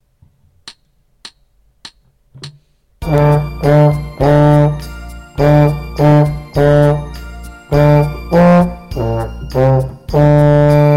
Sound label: Jingle Bells, Instrumental Music